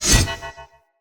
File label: Throw, Swords; Weapons; Player; Mockery Weapon Common